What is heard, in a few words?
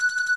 big Win Count Up; hotline2